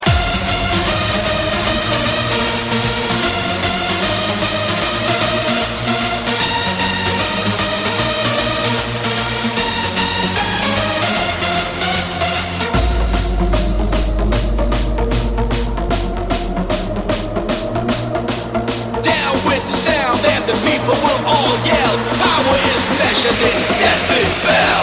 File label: Hardstyle